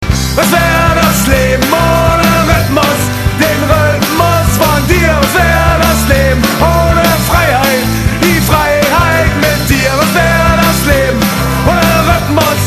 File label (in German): Rhythmus